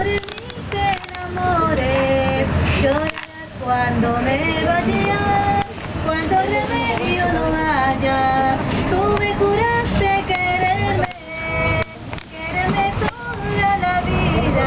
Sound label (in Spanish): mujer invidente cantando; BOLETO DE BUS